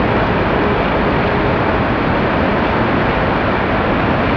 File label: Out Of Town Traffic